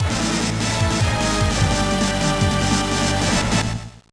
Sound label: Game Over alternate; Pinball of the Dead; gba